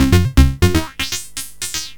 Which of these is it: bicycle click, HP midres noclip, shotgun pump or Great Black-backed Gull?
HP midres noclip